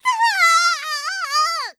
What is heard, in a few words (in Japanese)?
"だあああああ！", ショタ; ハイグレボイス集